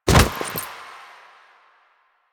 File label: Finisher Grab; Warframe; Kuva Lich; Finishers